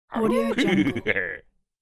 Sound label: psycho male laugh; human sounds